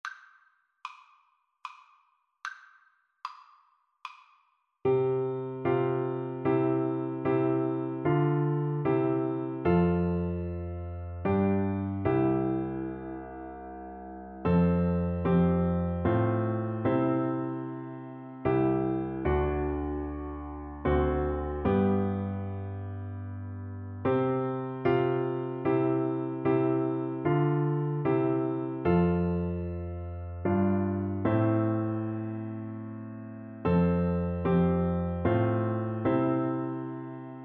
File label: Oboe